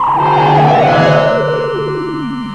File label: phaser malfunction